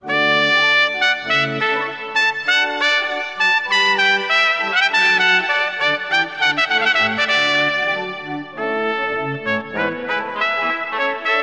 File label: Allegro Concerto in D Major, Horn and Organ; Jean-Baptiste Loeillet